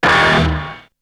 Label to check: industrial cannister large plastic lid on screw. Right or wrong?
Wrong